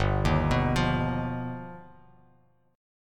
G Augmented 7th, Bass Standard; G+7 arpeggiated; 3,6,9,10; JGuitar

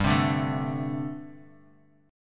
G Suspended 2nd Suspended 4th, Bass Standard; strummed; 15,15,12,14

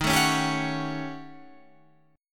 Eb 7th Sharp 9th Flat 5th Eb, Guitar Standard; strummed; chord

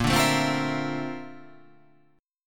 A sharp Major Sharp 11th

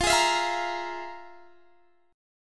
F Minor Major 7th Double Flat 5th; FmM7bb5; strummed; x,20,18,21,18,18

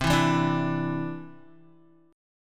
C Major Add 9th, Guitar, Cadd9 chord; strummed; JGuitar

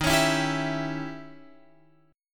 D sharp 7th Flat 9th Guitar Open F; D#7b9; strummed

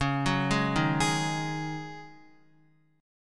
Db Suspended 2nd Suspended 4th Db, Guitar; arpeggiated; JGuitar

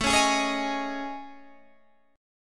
A Major 7th Flat 5th, down Guitar Half Step Down; AM7b5

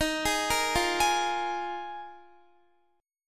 Eb Suspended 2nd Suspended 4th, Guitar; arpeggiated; x,18,18,15,17,15; 4E,4A,5D,5G,5C,6F

